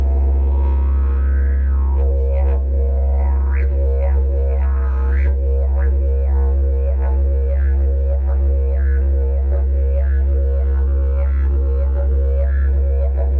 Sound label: didgeridoo; jilm mirotvor; průměr : 32 mm; Povrchová úprava : moření, řezba, olej; Ladění : C